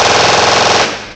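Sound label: RIFLE AUTO; CMI Sound Library Rev 1.3; MACHINES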